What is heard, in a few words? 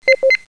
Sound Beep